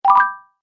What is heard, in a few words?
Classic Bonus Points, video game sound effects